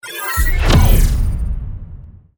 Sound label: ui kill boss